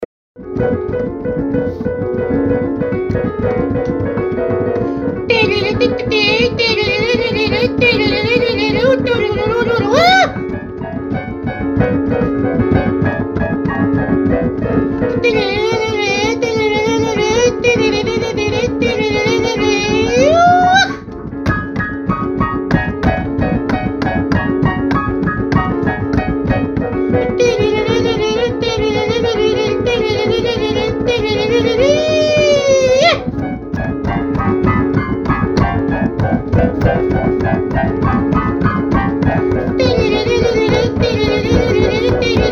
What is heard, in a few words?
folk